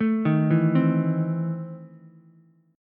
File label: E Major 7th Suspended 4th Sharp 5th Ukulele; arpeggiated; JGuitar